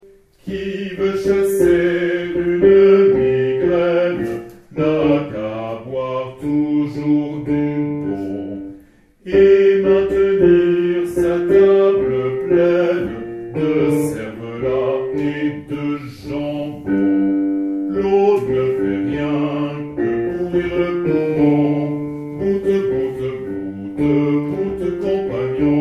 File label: Basses; CHANSON A BOIRE